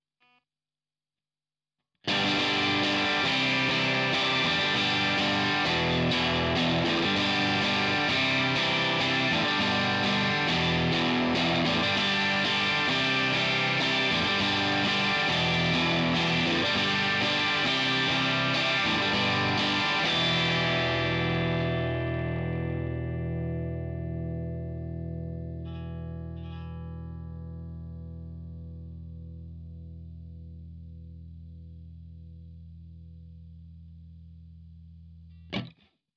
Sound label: Treble Dirty; Gitarrenvergleich